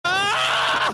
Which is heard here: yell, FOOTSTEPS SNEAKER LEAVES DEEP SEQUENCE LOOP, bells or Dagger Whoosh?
yell